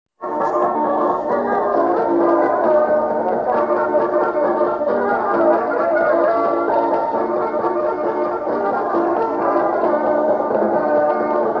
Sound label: circus fingers